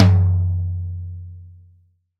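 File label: Acoustic Tom; Roland SPD-S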